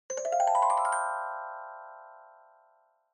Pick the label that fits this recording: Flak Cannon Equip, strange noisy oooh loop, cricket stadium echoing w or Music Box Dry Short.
Music Box Dry Short